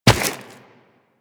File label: Run Rock, Footsteps; Warframe; Tenno